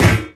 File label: physics turret impact lg